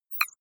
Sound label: click synthetic squeak bright, signale